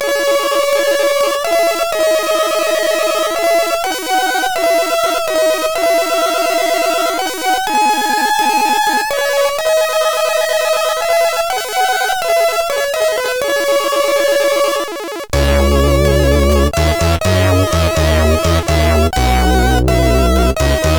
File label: A Music, CSG8580R5